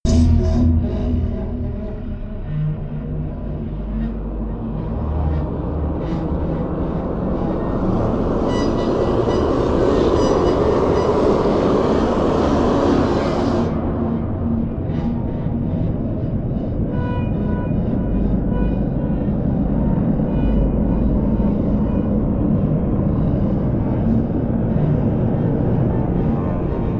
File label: atmosphere attacking low